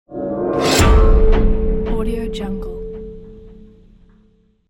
metal shut trailer hit

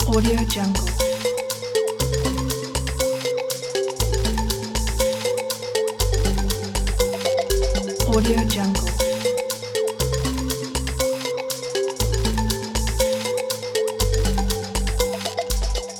game thinking time loop

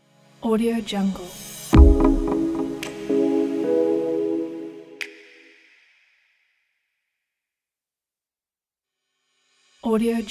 intro tech logo

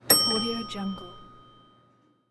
elevator bell ding; soundjay

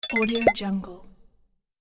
cartoon hyper thin steps; cinematic-designs

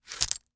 combat knife from holster; prosoundfx

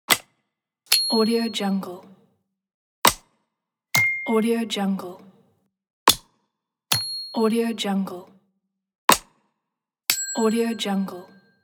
subscribe button

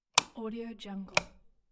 turn on off

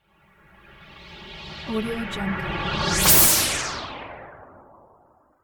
abstract noise woosh

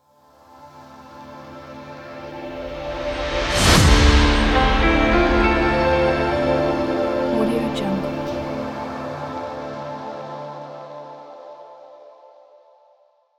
futuristic epic piano hit logo

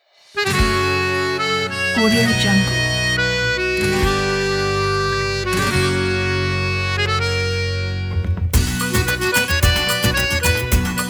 bom baiao

